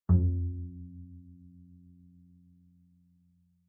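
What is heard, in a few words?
Bass pizzicato fortissimo sul A F2, Double Bass; MIS Pitches - 2014; Strings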